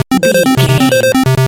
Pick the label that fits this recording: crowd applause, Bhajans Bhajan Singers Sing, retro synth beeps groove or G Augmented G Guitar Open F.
retro synth beeps groove